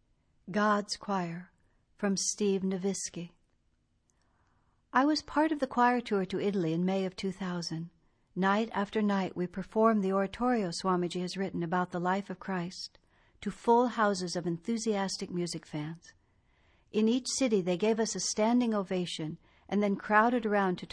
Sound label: Choir